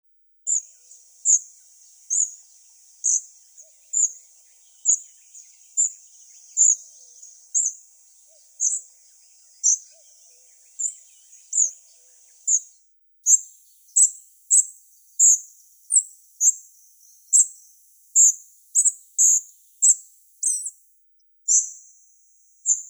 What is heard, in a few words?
Muscicapa striata